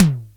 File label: DRM SIMM, Analog Drums; Roland L-CD701